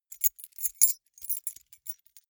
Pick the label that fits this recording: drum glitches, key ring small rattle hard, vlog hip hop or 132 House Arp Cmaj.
key ring small rattle hard